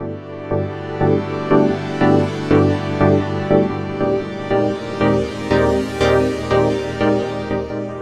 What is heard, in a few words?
NRG Pad